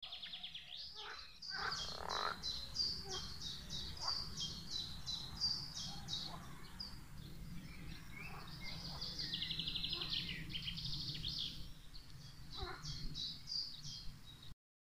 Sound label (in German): Frosch; Cooler Frosch